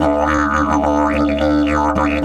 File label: 108, DIDGE 108BPM; Big Fish Audio - Didgeridoo and Other Primitive Instruments